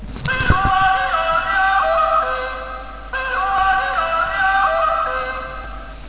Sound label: yodle